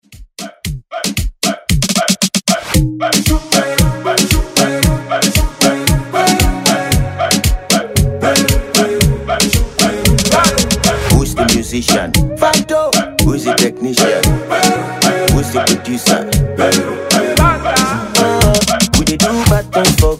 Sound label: Afro Reggaeton Redrum